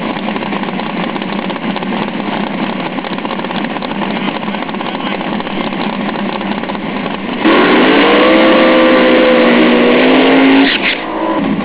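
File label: drag, drag racing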